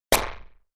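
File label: Electric Hit Reverbed, multimedia element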